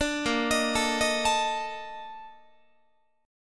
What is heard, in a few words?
Bb Major 11th Bb Guitar; arpeggiated